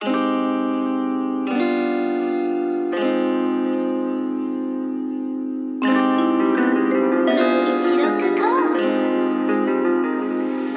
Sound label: Cm BPM165